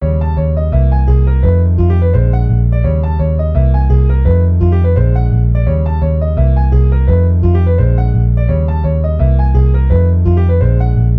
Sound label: ii harms for sound maj